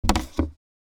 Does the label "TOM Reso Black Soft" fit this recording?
No